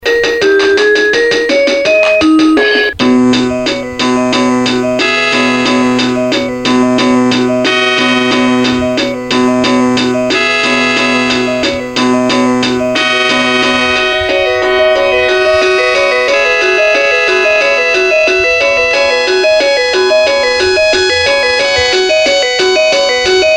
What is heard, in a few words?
Bent HMP-139, Circuit Bent